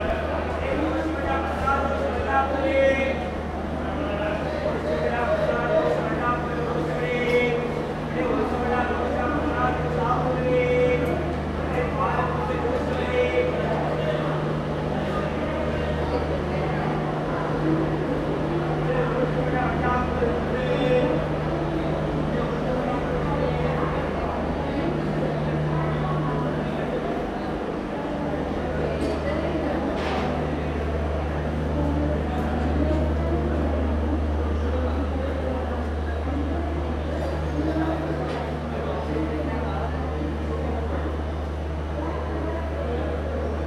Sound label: market chants, South India street recordings